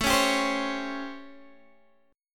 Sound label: A 7th Sharp 9th, Guitar, Open D